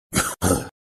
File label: cough; garcello